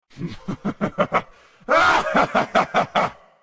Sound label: laugh